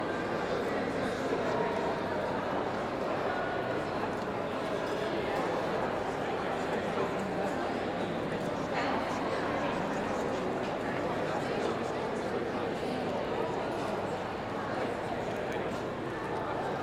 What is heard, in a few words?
People Ambience Large Hall